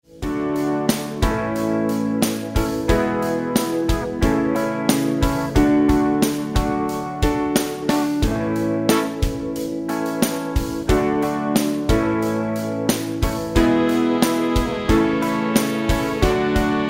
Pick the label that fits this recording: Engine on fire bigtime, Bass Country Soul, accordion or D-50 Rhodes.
Bass Country Soul